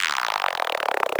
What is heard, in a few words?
loop, techno atmos